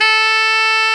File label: SAX TENOR F, SAX Tenor ff